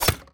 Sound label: ui dagger